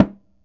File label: Dull Tone, World Percussion One Shots; Sony CS